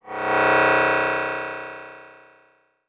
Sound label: Title Transition Delay Short